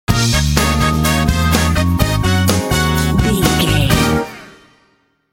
feel good funkers 5 sec logo

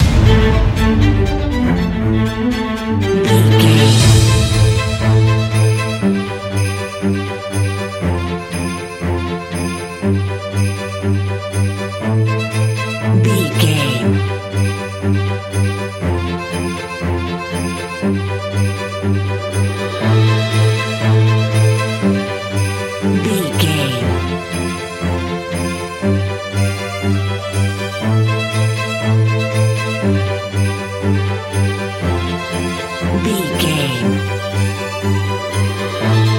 cinematic orchestral epic christmas

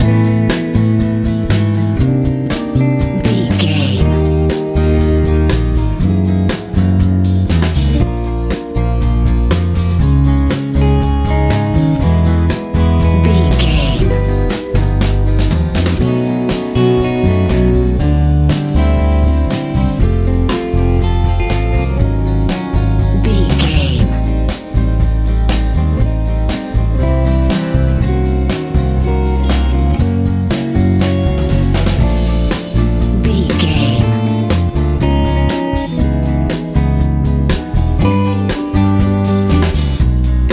hot powerful med rock new wave

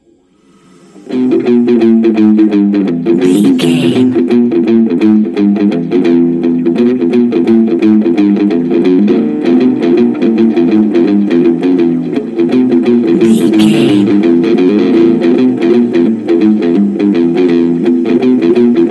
punky frolic chase

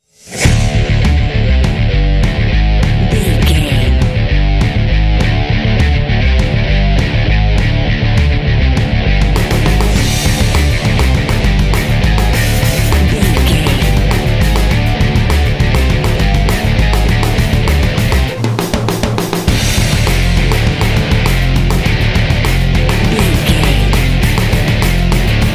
titans rock bumper

